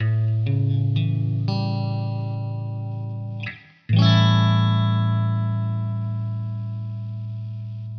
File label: a dom7